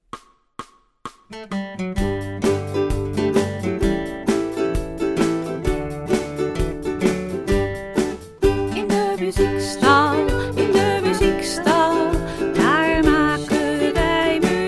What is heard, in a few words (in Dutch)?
de muziekstal met zang